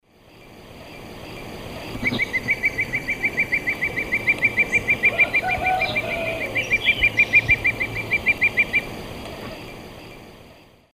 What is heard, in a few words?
Buteogallus urubitinga, song or call; S. America; Eastern Lowlands